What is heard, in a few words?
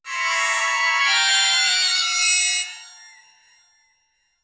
modern elegant slider